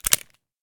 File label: hammer, webley